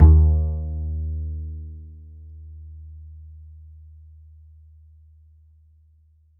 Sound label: D, Upright Acoustic Bass; 1ST POSITION; Larry Seyer